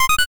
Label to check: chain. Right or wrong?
Right